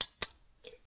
blacksmith, metalworking

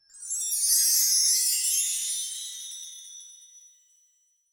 instruments

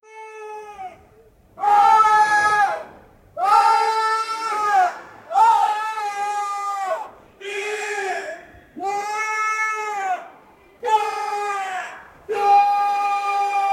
crying; human emotion

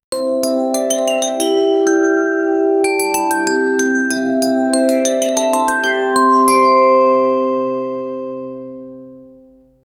fairy tale music

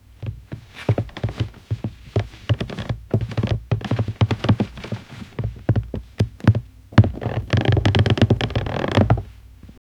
the low scraping sound of, wooden planks

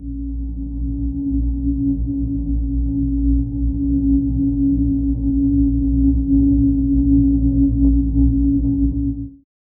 gothic ambient hum soft

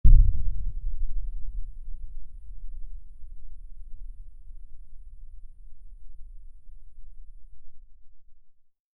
stone on stone hit sound

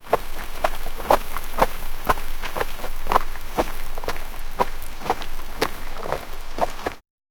a man slowly limping, movement

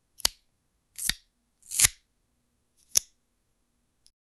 glue, adhesive

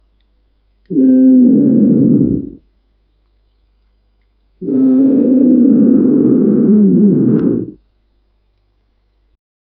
lion roar

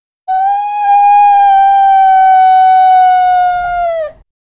distinctive long baying, hunting

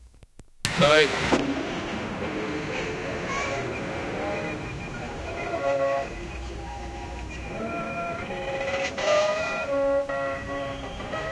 vintage old cars honking; radio tuning

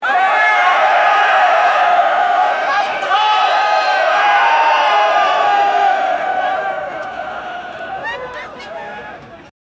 the crowd shouts the name

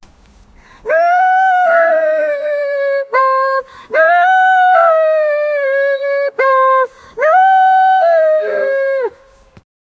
hound dogs, singing; 3 or 4

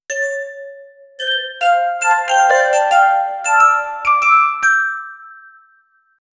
a brief cinematic success, discovery

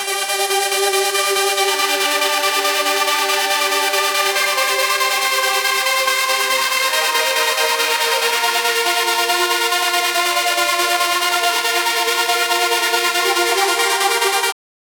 futuristic trance string

